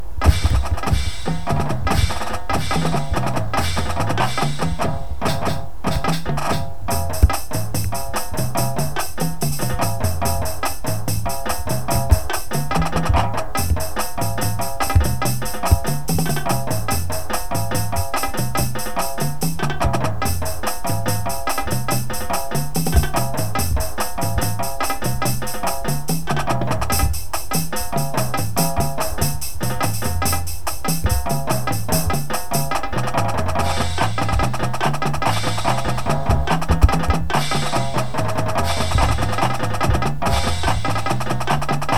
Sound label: full drumline